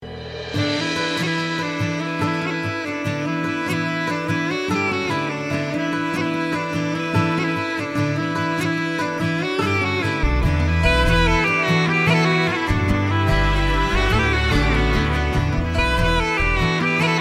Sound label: INSTRUMENT